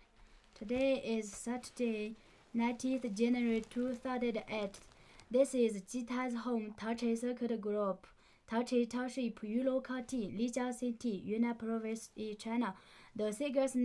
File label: Yulong Folk Song